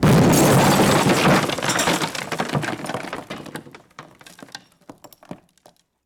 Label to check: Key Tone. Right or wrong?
Wrong